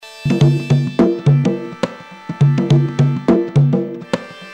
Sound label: Dirty Noise Drums